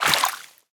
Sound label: water entry; ambient